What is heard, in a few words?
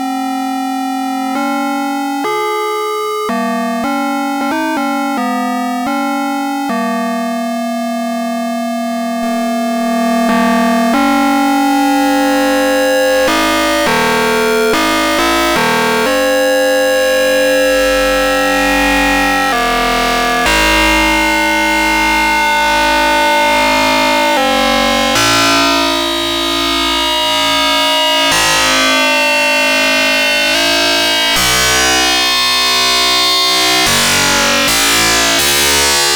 tri sine mega folding fm; cyclebox II